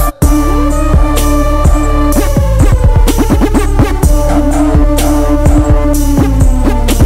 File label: Punjabi Folk Trap